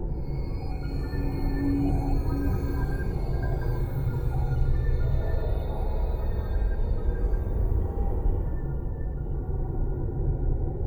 ambience

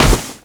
Mace cloth, Damage

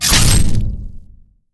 illusion attack

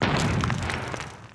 ATTACK; Chooty; Mob

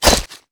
Sword Shell, Damage

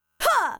Hunter attack

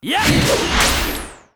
attack; Commando; Mob

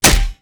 Mace Shell, Damage

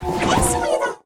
RECRUIT ATTACK; BARAS; Mob

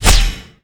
Mace Scale, Damage

